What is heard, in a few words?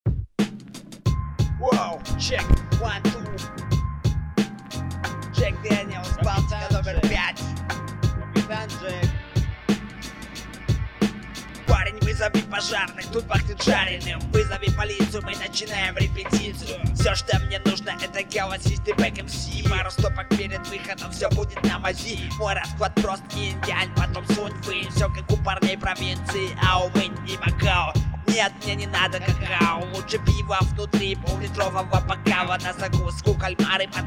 instrumental